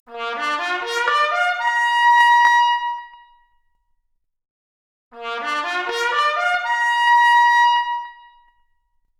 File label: Trumpet arp, Stereo output noised; 4bit